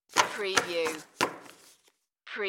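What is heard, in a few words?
Bang Index Cards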